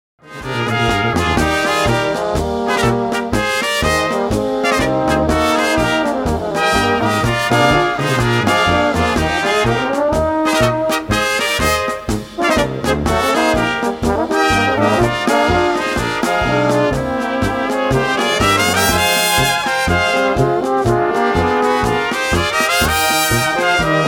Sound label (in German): Polka